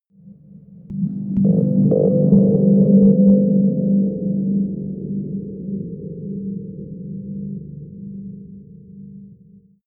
intense alien confrontation

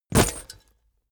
leather metal bodydrop

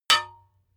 hammer hit metal dull

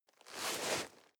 nylon leather soft short

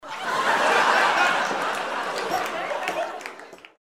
laugh table

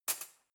cutlery knife drawer place

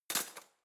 cutlery spoon drawer place hard

